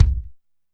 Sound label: Kick, Alesis DM5; Drums